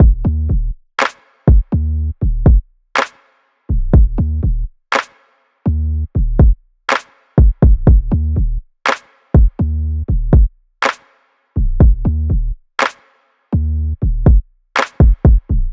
Drums 122bpm Clap Loop Trap

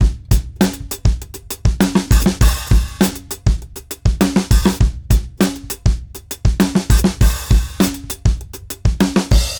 Sparkle beat

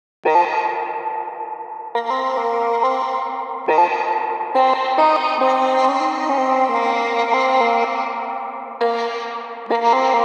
140 VOX

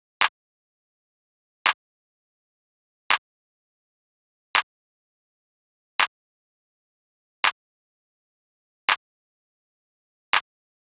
166 Cm clap

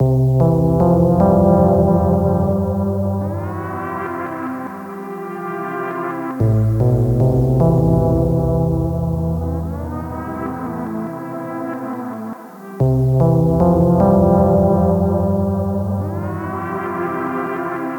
melody loop 75bpm C major

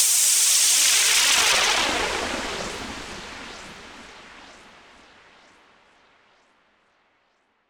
FX Downlifter

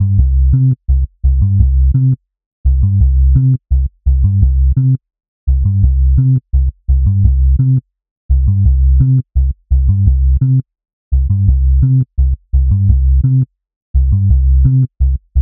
Bass 85bpm Gm Electric Finger Soul

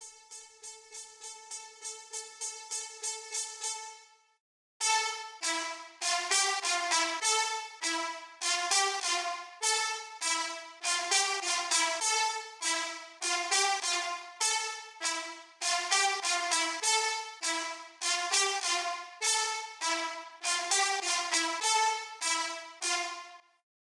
Brass Leads, Amin

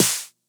Snare

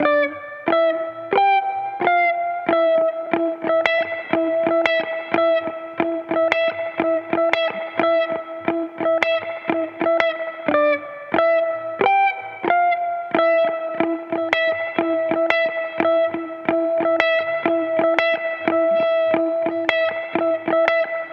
90 guitar rhythm Dmin; alpha

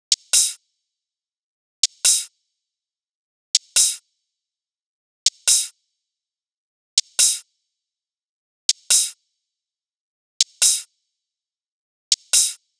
hi-hat loop 140 sway

